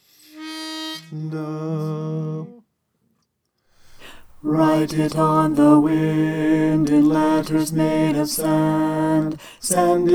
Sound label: soprano